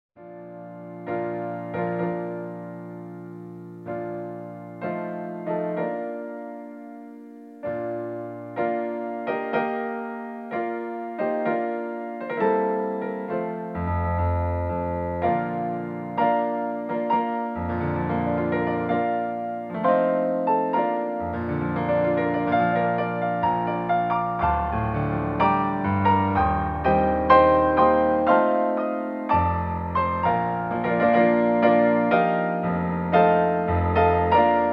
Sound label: Bridal Chorus, piano